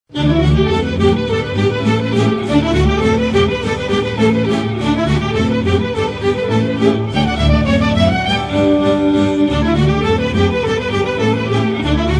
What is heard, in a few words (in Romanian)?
Suita de Melodii populare din Timis - Roumanie